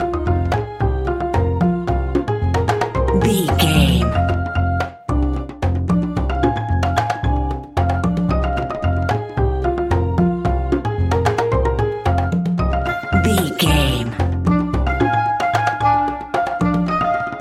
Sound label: fun funky toadstool